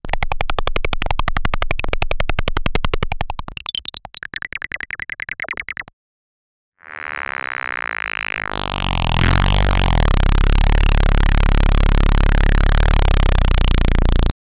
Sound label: electronic midbas fm morp